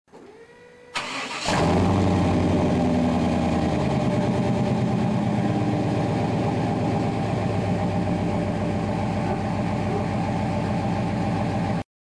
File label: LT1 El Camino idle